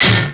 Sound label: HELI HIT; ASSAULT; PC Gamer 46